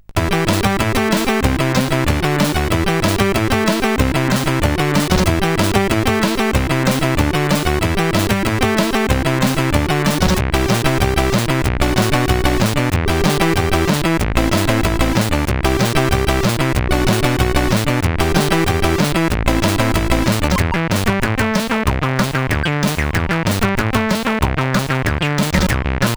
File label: sid, CSG8580R5